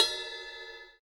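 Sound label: Bell Softest, cymbal; hydrogen drum samples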